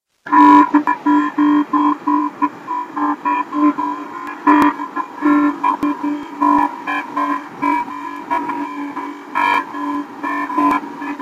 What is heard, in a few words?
Sinewaves